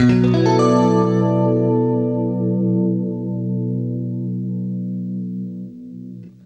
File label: CLEAN BM9, Guitar; East West; Steve Stevens Guitar Samples Collection